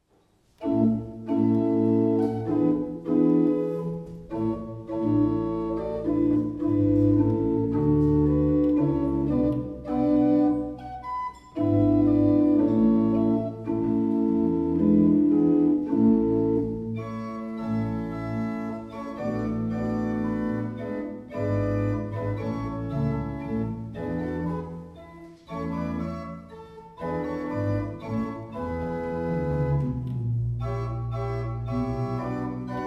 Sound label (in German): Zugabe Menuett, Orgel; Orgelschaetze; Eitzendorf